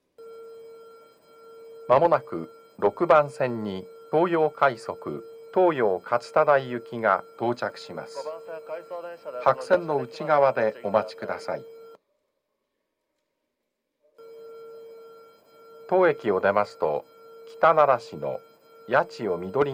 nishi funabashi toyo rapid toyo katsutadai, station